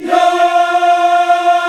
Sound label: F 3, CHOIR YAS, Africa; Spectrasonics; Heart of Africa 1